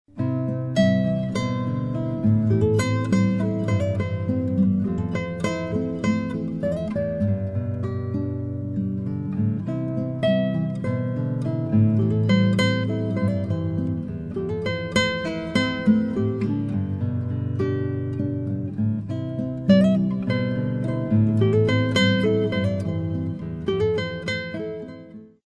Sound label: acoustic guitar